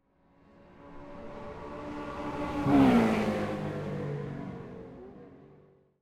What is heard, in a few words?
race car ctcc straight by medium slow growl; sonniss gdc